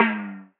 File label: POP TOM